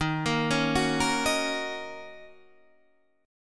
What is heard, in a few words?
D sharp Minor 7th D sharp, down, Guitar, Half Step Down